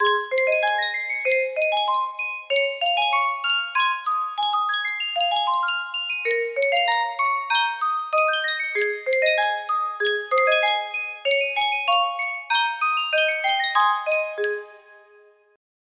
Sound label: yunsheng music box, music box melody